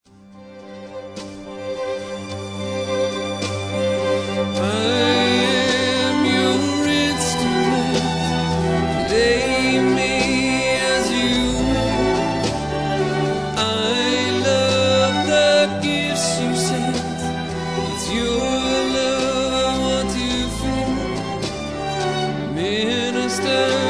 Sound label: instrument